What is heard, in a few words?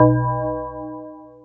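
system clock; Etrian Odyssey I HD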